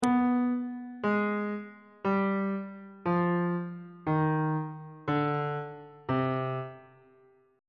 b sharp harmonic minor scale descending on bass clef